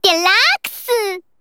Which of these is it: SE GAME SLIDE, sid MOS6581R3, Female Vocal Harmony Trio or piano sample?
SE GAME SLIDE